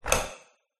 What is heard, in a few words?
household light switch pull chord on or off bathroom room reverb